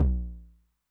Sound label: Low Tune Long Decay, 909 LOW TOM; classic machines; LT - LOW TOM